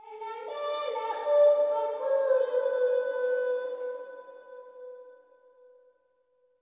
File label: LTLE GIRL, AFR.GIRL; Spectrasonics; Heart of Africa 2